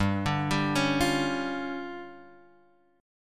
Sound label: D Minor Major 7th Double Flat 5th G, guitar